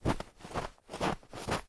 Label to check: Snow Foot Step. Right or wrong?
Right